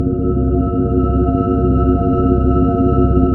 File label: SYN ATMO; Sound & Vision - Gigapack II